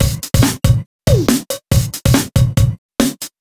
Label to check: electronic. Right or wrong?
Wrong